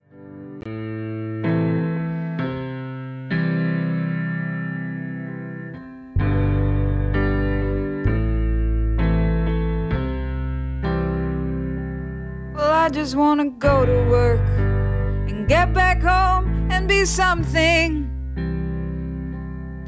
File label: riff